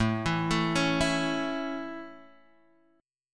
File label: A Major A; arpeggiated